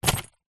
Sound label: car keys place down on car seat